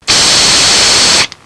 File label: AEROSOL; HAUS